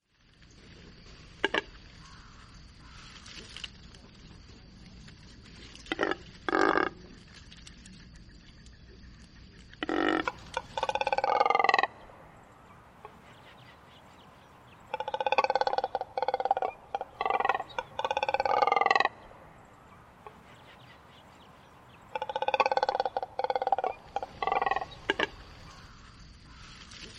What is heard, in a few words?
Cormorant Call